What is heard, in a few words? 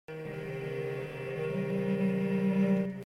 8va node G string overdubs; For string performers; fivefingers